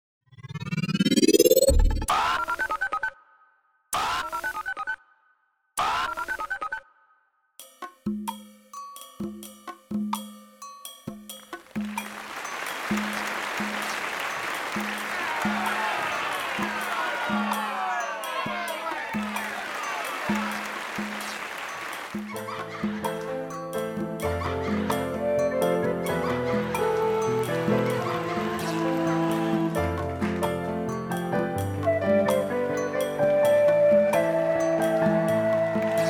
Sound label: MIDI ditty; spellman studio